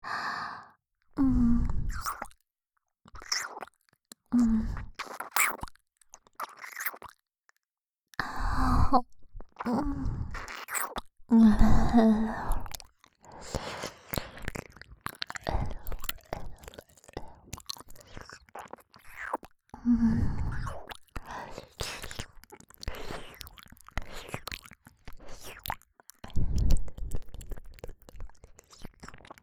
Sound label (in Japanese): 耳舐め R (右耳); 会長